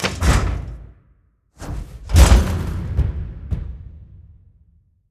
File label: ambient port; World Of Warships; port3d